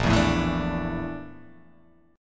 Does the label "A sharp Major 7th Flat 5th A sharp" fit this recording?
Yes